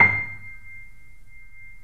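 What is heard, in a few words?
C6, Bösendorfer, 3D Pianos; E-MU Producer Series Vol. 5; 3-D Audio Collection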